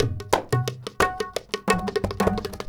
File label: INDIA L, Heart of Asia, IND ENS